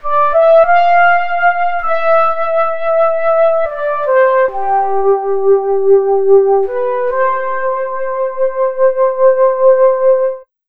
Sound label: Synth Flute; Sonic Foundry (Sony Creative Software); Slow Silhouette